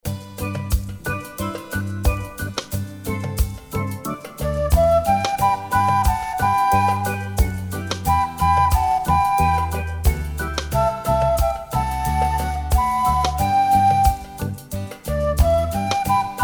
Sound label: Reggae